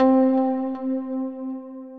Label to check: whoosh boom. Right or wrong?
Wrong